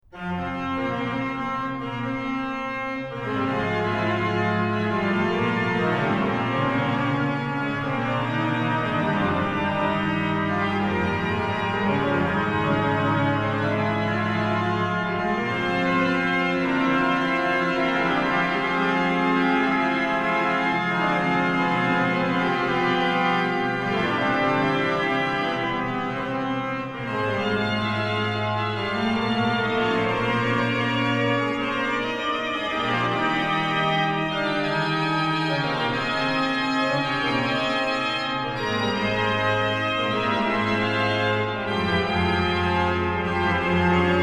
Fughetta, organ; Fughetta super Wir glauben all an einen Gott BWV 681; BACH; BACH ORGAN WORKS; CHORALE PRELUDES

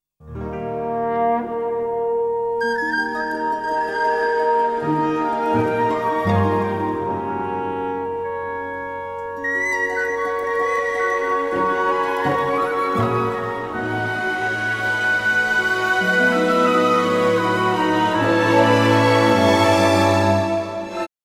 Pan flute music

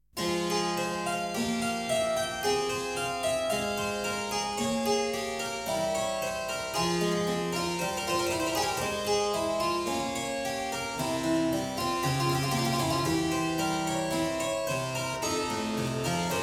Prelude in F minor, clavier; Prelude & Fugue No.12; BWV 857; BACH; WELL TEMPERED CLAVIER; BOOK I

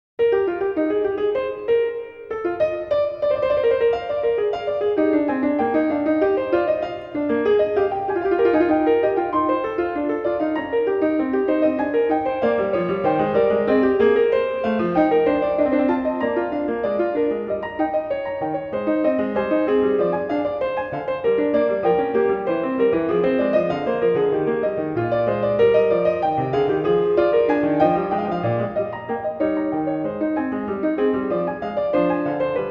Fugue in E-flat Major; BACH; WELL-TEMPERED CLAVIER; WTC I; BWV 852

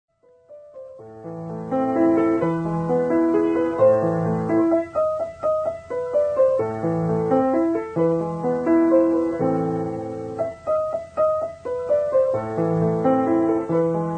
Fur Elise

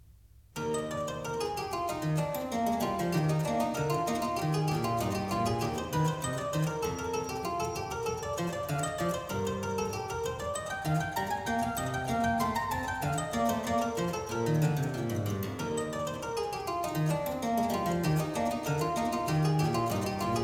E flat major, Air; French Suite No.4; BWV 815; BACH; CLAVIER WORKS; FRENCH SUITES

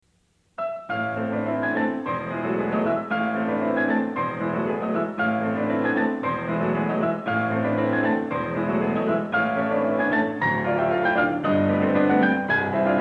Variation VIII; MICHELANGELI; BRAHMS; Variations on a theme by Paganini, Op. 35; Book 1

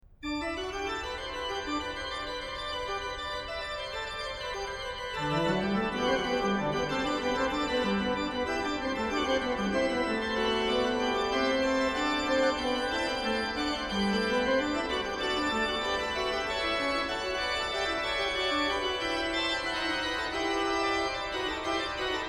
Mach's mit mir, Gott, nach deiner Gut, organ; BACH; BACH ORGAN WORKS; Chorale Preludes

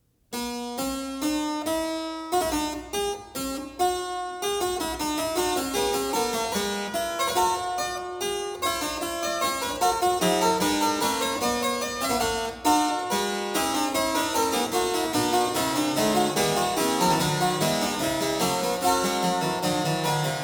fugue; BACH; WTC 1; BWV 846

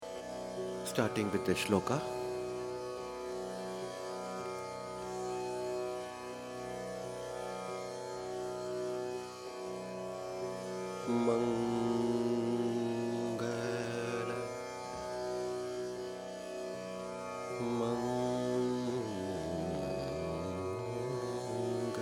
Raga Desh (Shloka), Dhrupad, Indian male vocal

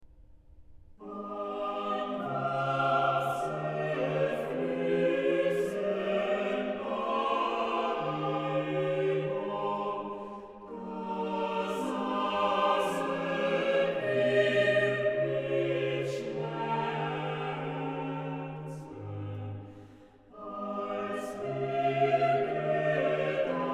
Chorale, organ; BWV0267; An Wasserflьssen Babylon; BACH; BACH ORGAN WORKS; CHORALE PRELUDES

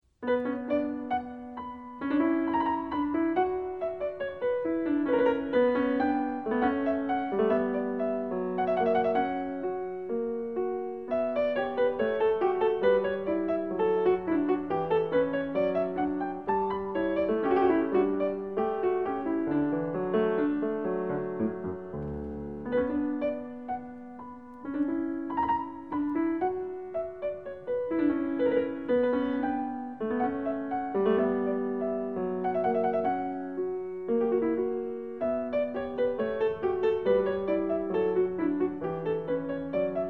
sarabande; bwv 814; French Suite 3; BACH; FRENCH SUITES

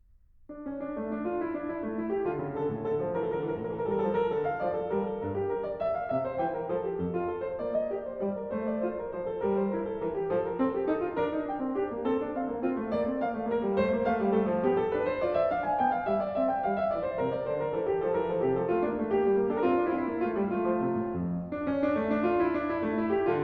Prelude In D Minor; BACH; COMPLETE SOLO CLAVIER WORKS; TOCCATAS PRELUDES FANTASIAS

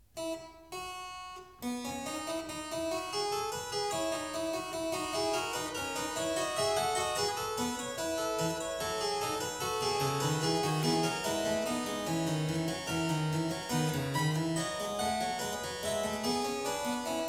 Fugue in E major, clavier; Prelude & Fugue No.09; BWV 854; BACH; WELL TEMPERED CLAVIER; BOOK I

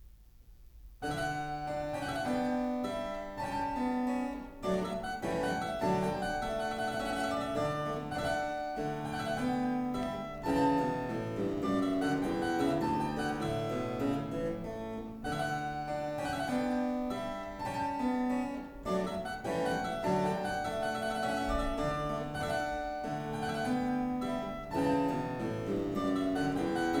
D major, Menuet; Partita No.4; BWV 828; BACH; CLAVIER WORKS; PARTITAS

